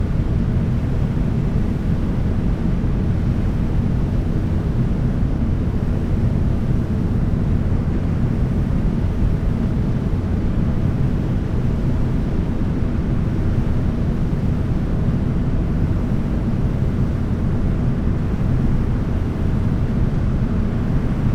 Brown Noise

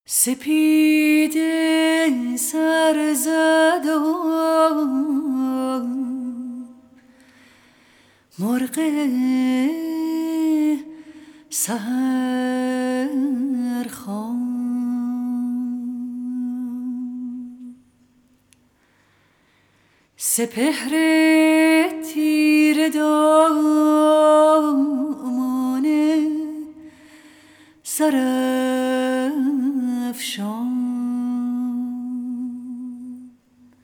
Young Iranian female voices

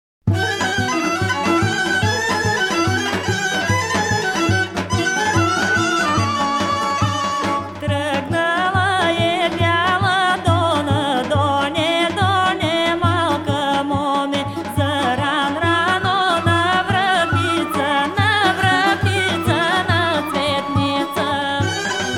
Bulgarian Folk Music

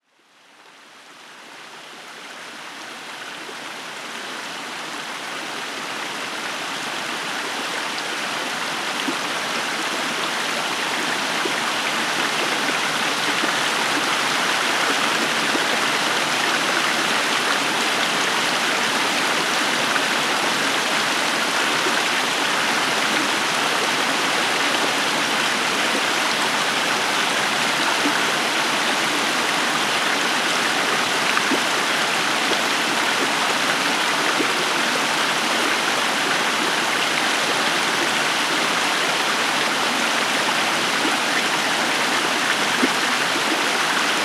Calming River Stream; Nature Sounds Sleep Solution for Tinnitus; Nature Sounds Tranquil Soundscape

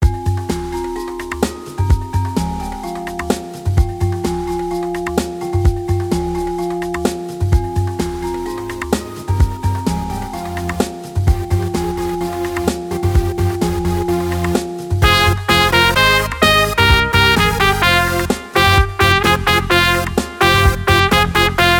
Acoustic Trumpet Mix

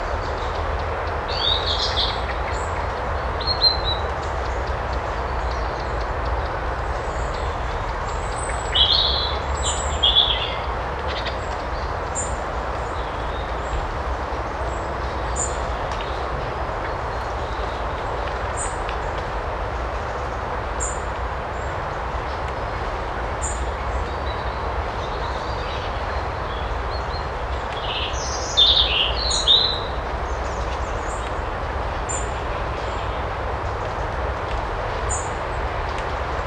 Rain Sounds Soothing River

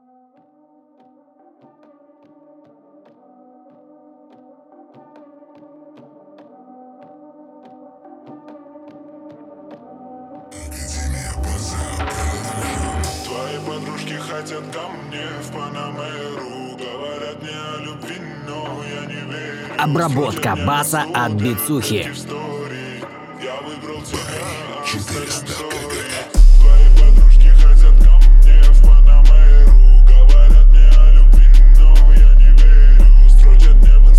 Low Bass

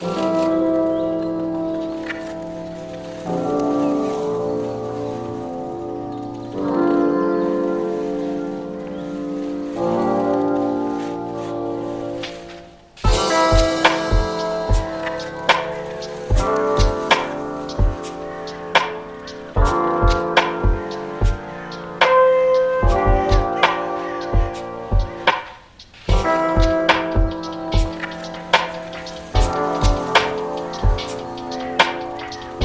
LoFi Chill Hip Hop

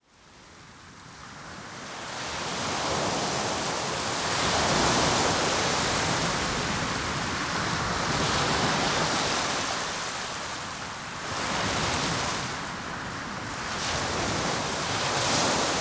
Ocean Currents, Ocean Sounds

